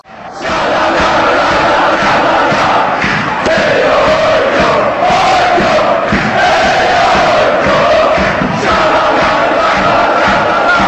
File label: tralalalalala go aik, fanchants sweden